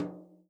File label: tom, Real Drum Kit, jungle kit